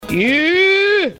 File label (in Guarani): grito iiiiiii